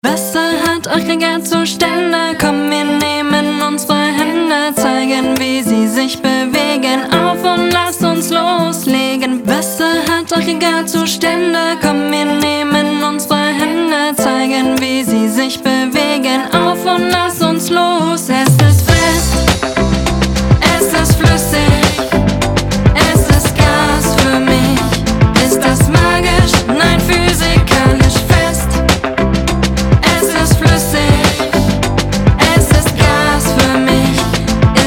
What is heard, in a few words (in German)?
Lied mit Rap; Aggregatzustaende; FLUeSSIG